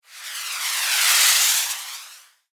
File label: Fire Flare Pass By, Science Fiction; Spacecraft Sound Effects; BlastWave